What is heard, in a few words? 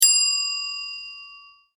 Foley Desk Bell Ring; Miscellaneous Office; Offices